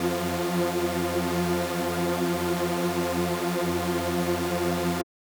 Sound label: SuperSaw F4; KG MegaSaur; M8